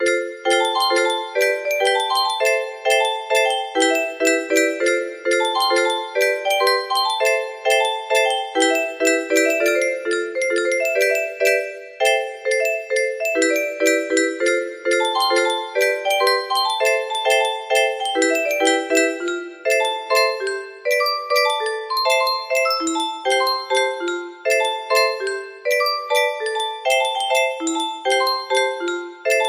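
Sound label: music box melody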